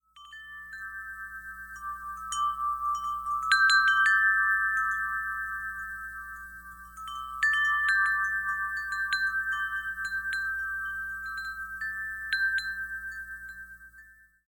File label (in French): carillon moyen bronze; habitat hibou